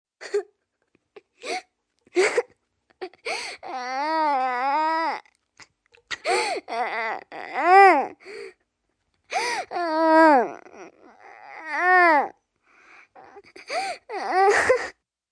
Cry Kid Sobbing, Cry, child, sobbing & whining, pain, hurt, sad (human voice); Crying; Voice Box